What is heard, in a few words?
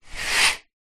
household shower curtain pull